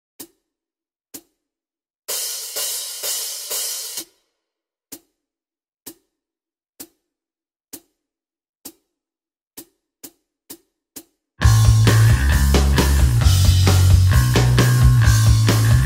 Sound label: backing track for guitar original tuning D